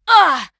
Female Fight Damage; mighty party 2017 gamerip pc ios android

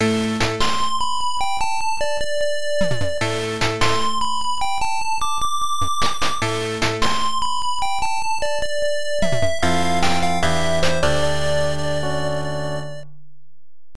Main Theme Fanfare; Jinn Eien no Yuushi; pc-98

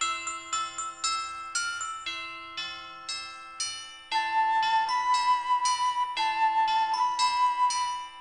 Menu; Christmas Night Archery

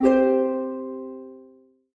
PUZZLE Success Harp Three Note Bright Wet stereo; gamerip; the looker

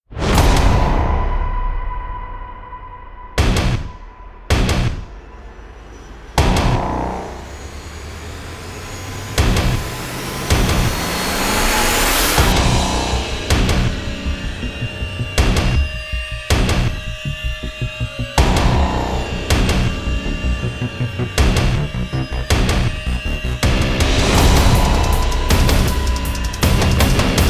Intense action trailer chase; Chasing Dead; Wii U

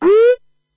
Se Death; Kairobotica

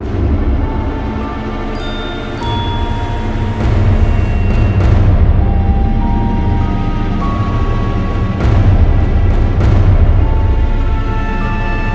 Cinematic Pre Boss Creepy; Shantae Half-Genie Hero

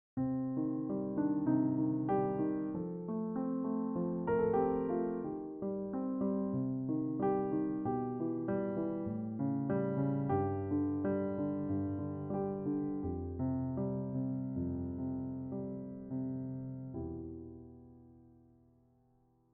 Piano Intro; rusty lake paradise

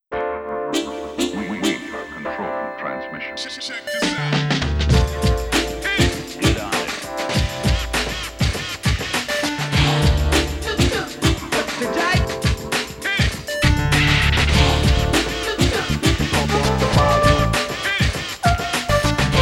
JAZZ; beatmania 4thMIX 1999

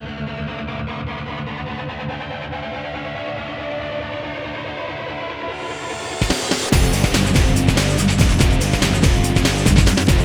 Boss Battle; Super Monkey Ball 2; Monkey Shot

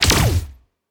Weapon Shoot

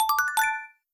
Receive Item

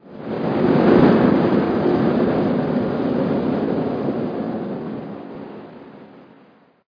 Ambient

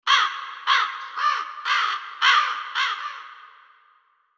Crow; Yu-Gi-Oh! Master Duel

